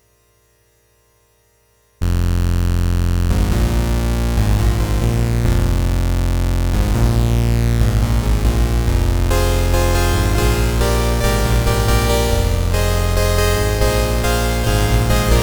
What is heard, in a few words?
SID esizer